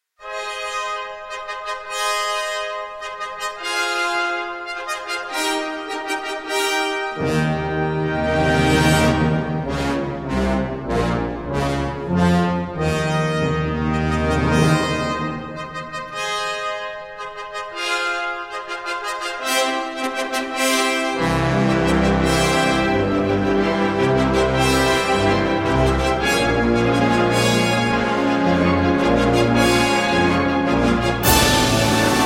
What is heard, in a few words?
Festive Overture